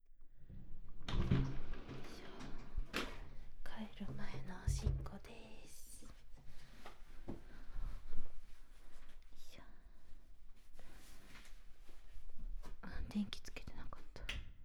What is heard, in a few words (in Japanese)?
おしっこ; 滞在中のおしっこ; 帰宅前